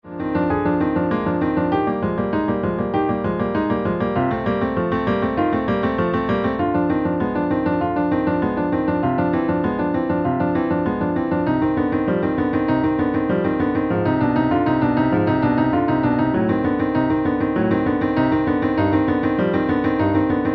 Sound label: Prelude and Fugue